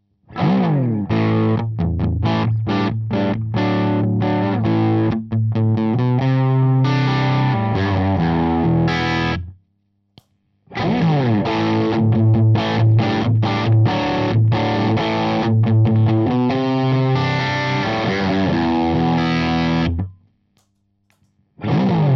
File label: AZ Gain Tone FAT